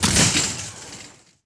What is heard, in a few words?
grate slash; impacts explos